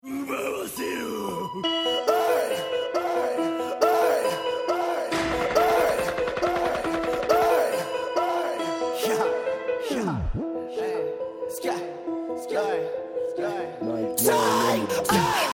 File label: start round, music